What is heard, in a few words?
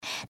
breath; pony; Twilight Sparkle